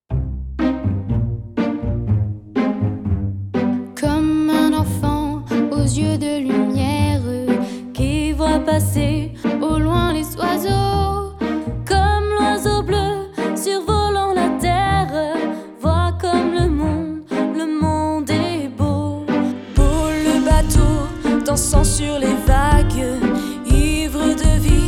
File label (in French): Version chantee